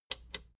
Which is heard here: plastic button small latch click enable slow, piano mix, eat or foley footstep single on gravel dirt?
plastic button small latch click enable slow